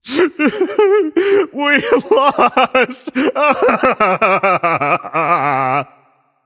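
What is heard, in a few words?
nonverbal boohoo; voicepack; smite; merlin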